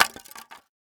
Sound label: styrofoam drop on ground; a dump in the dark